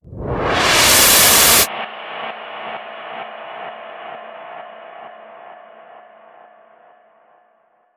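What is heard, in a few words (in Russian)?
skrimery, screamers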